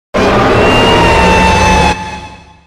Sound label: screamer